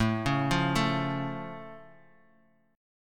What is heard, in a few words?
A Augmented Guitar; arpeggiated; JGuitar; 4,4,5,2,x,x